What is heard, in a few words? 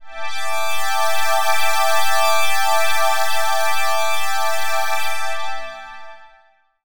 ELECTRO PAD 140 A; Keys